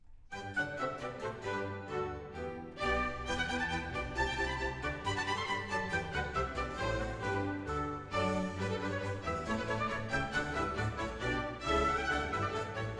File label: Water Music Suite in G Major Rigaudon; Handel; Fireworks Music & Water Music